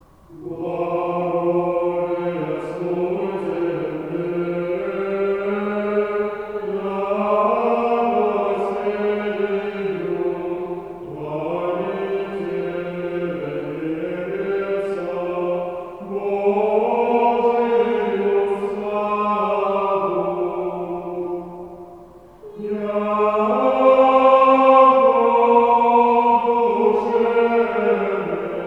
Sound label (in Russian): Благовещение. Ирмос 9 песни с припевом. Задостойник